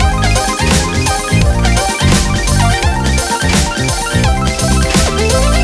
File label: big win music; netent